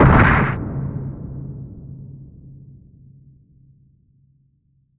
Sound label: Anime Mech Step